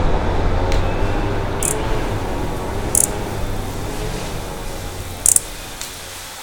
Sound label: Hylocharis chrysura foraging